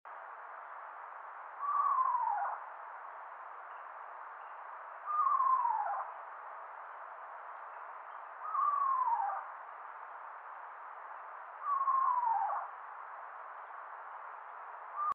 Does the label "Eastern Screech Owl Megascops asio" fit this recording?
Yes